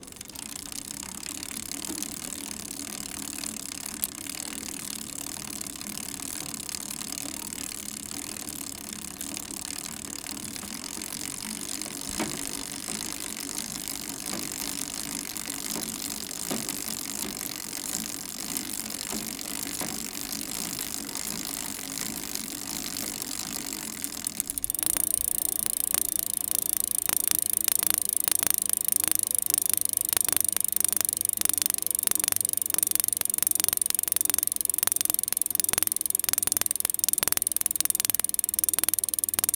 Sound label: 10 Speed Fast Pedal, Bikes; Vehicles